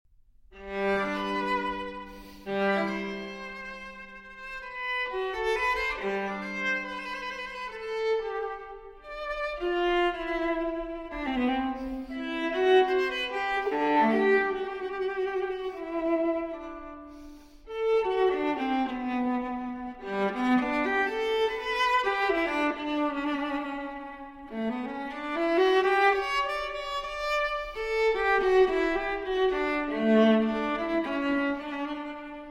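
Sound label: Cello Suite No. 1 in G Major Transcription For Viola Sarabande; J.S. Bach; BWV 1007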